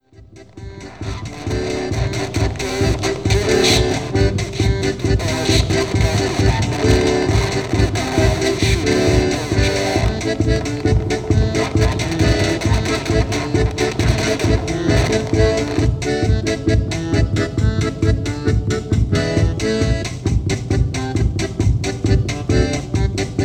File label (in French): tekno musette